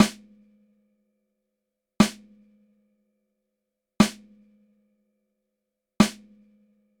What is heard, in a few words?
snare mono, Mono Snare; transient sounds like the snare sample below where distinct echoes can be perceived with as short as a 20 ms delay; Haas effect